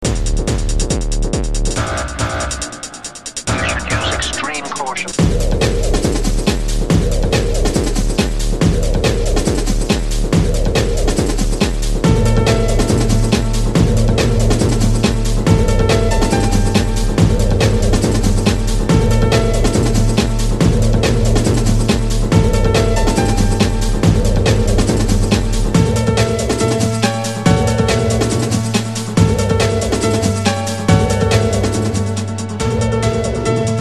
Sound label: intro music